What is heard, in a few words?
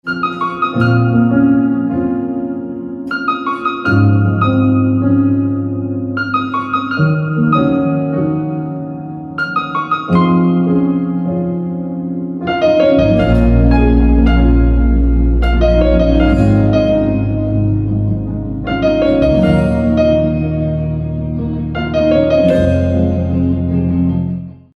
Piano Instrumental Ringtone